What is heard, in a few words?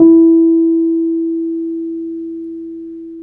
PNO T.Wurly E 5; Keyboards of The 60's and 70's